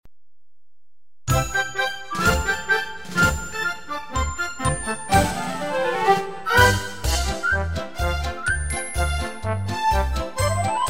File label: polka